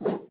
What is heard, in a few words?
yeti hurt; monsters